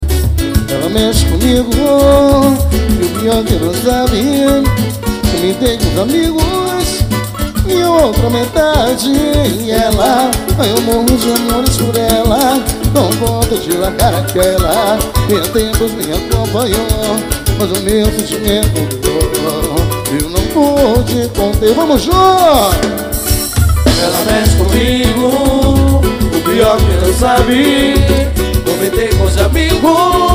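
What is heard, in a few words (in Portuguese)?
show ao vivo no Pelourinho, samba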